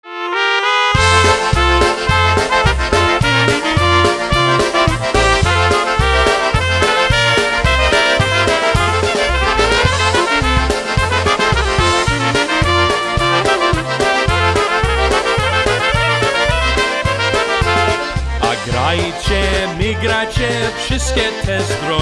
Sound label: Polka Music Polka